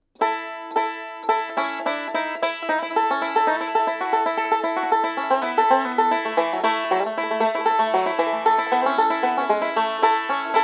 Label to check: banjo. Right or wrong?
Right